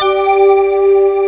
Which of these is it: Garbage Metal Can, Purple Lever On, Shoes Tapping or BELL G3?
BELL G3